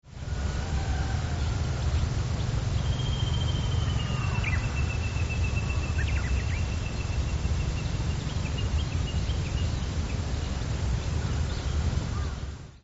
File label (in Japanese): ikaruga; tsuyu; 法隆寺の真裏あたりの丘。田植えを終えたばかりの情景。静かです。; 法隆寺の裏手